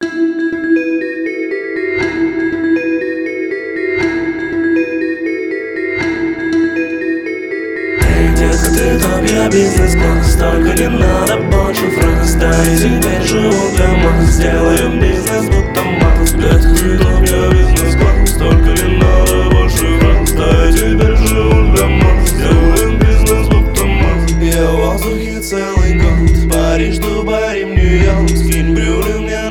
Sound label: instrumental